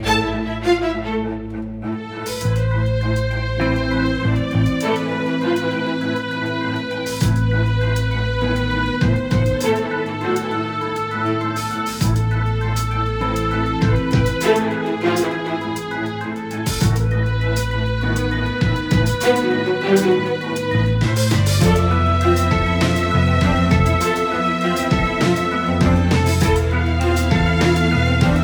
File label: loop 200bpm